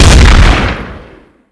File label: shotgun shot